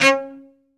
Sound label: SOLO CE, Strings; ILIO